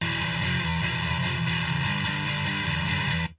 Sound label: badmetal, guitars